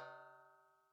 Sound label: ting cymbal